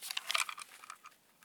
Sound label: weapon check flip; Escape from Tarkov